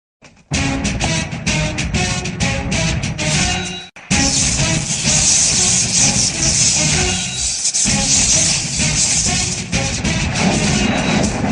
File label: angry bgm; ntr; Simhadri; telugu